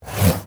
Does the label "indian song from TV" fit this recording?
No